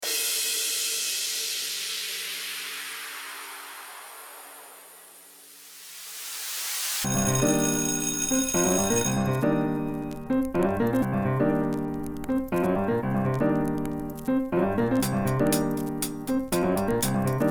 coopbeat

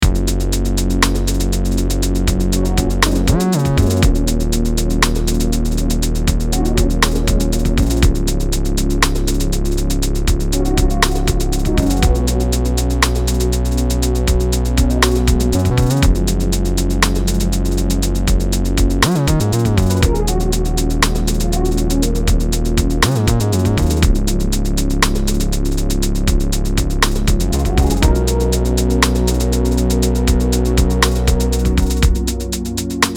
but trap

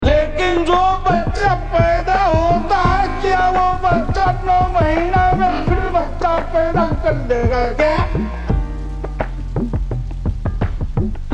beat

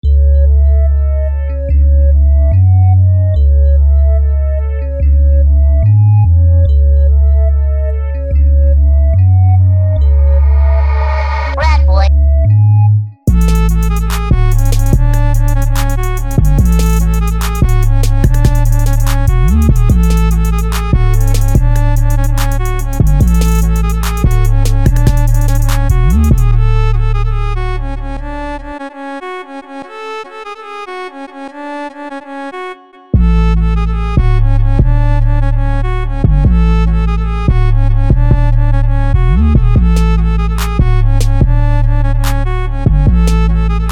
accordian

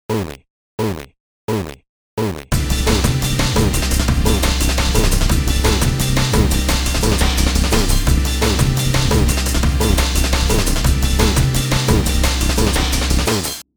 Oley and Bass

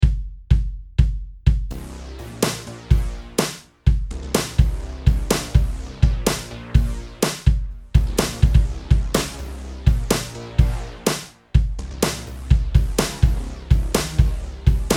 industrial

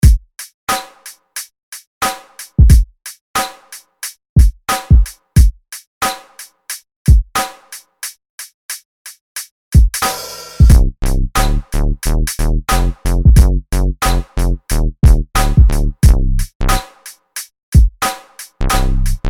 oley loop bass

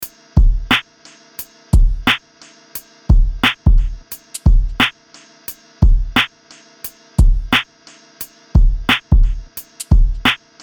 beat

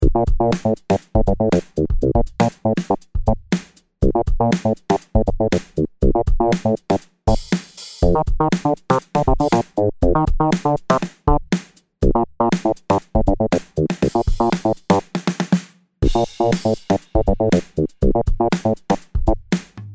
fiery funk

